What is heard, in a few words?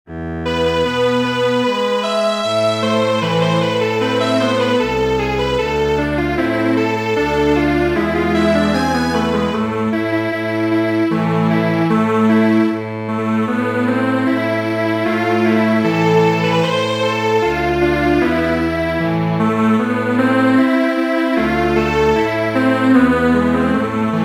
Strings Quartet